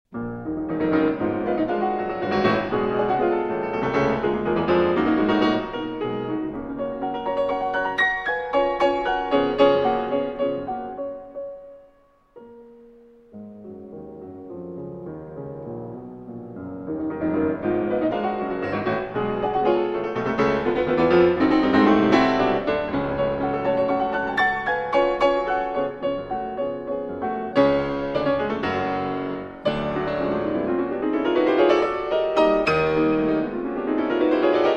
Piano Sonata No. 2 in B-Flat Minor, Scherzo - Più Lento - Tempo I